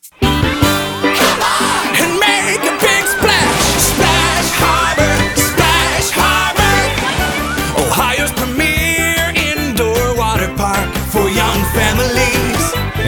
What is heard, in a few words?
Full Sing; Splash Harbor; roller skating jingles